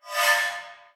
shiny rock shimmer

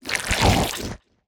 death reverse

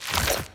face attack hide; gurdy

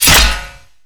tooth and nail

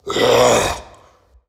knight growl

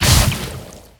beast lava ball splash

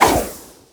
sword spin

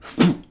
spitting; greed shooting

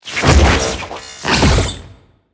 teleport

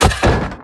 crawlspace dig; godeeper

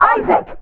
mom muffle

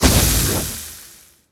beast fire ring burn

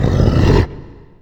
death summon skulls

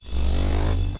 light bolt delayed

